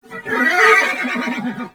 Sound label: Horse Vocal Mount Neigh; Warframe; Duviri Horse; Vehicles